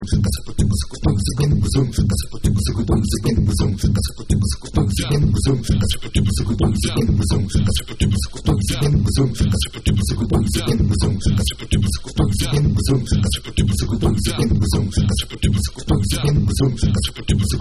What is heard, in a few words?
DRUMIN VOICE Percapella